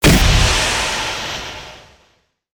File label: Full Steam Ahead On Hit